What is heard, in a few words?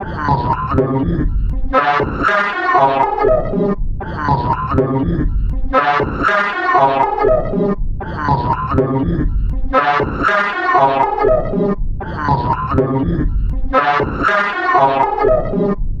KNOT FX LOOP BPM